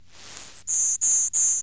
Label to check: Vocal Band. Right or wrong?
Wrong